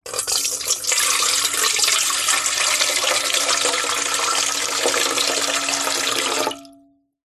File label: household water pour medium into large metal bowl; zapsplat